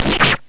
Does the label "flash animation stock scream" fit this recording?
No